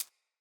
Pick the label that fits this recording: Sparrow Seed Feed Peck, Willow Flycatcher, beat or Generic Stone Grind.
Sparrow Seed Feed Peck